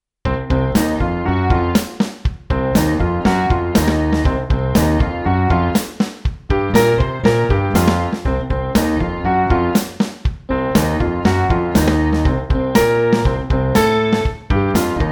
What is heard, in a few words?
Riff n Rhythm